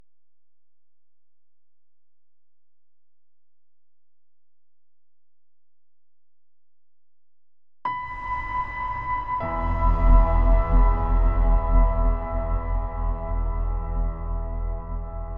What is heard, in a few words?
Piano Version